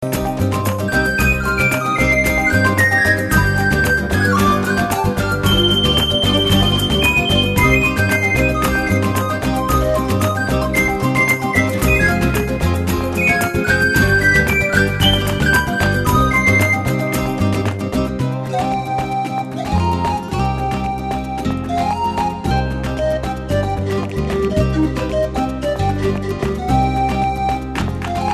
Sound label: Flamenco